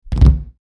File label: Impact Body Fall Wood Floor; Wrestling and Struggling; SFX Bible